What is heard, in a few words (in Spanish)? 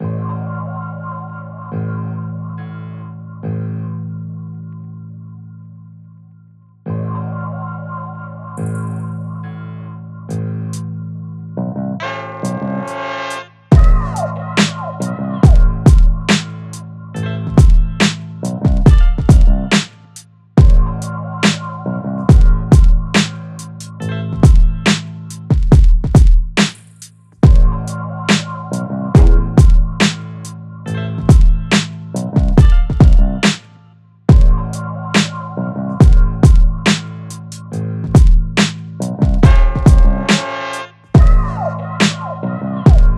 MUESTRA 70BPM